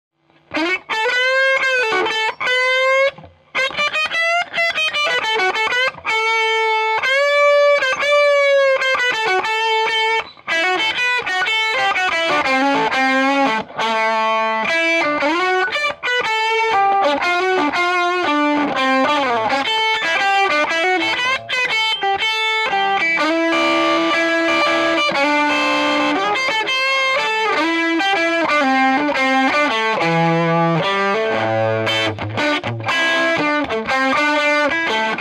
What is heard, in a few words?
strato nom brg